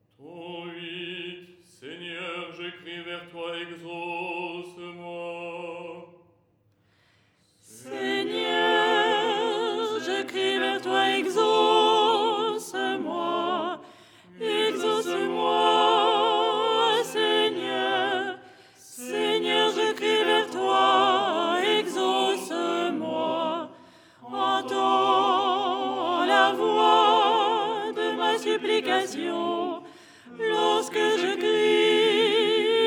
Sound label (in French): soprano; ton8